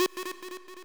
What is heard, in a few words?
sub lead f#3